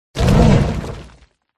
taran attack voice middle

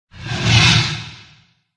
dragon boss attack voice middle

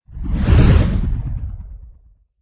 black widow

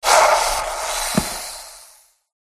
scorpion death

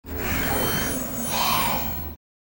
Legend Dervish Stun

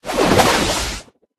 zombie buff

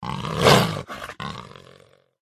attack voice bottom; valdagor

